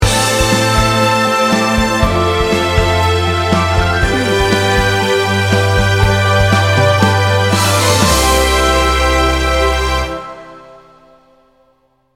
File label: inspired dreamer sting bumper